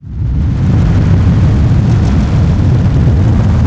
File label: Rumble; Eldin; Rumbling as Lord Bullbo begins running (final 132,208 bytes loop)